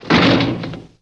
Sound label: Body Fall On Metal